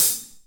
hihat sample a sharp key, Loudest frequency: 9608Hz